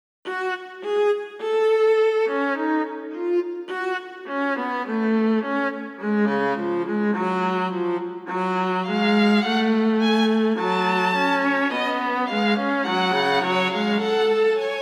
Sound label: String Trio in F# Minor; Umineko When They Cry